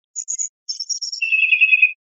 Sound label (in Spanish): primaveras, verderon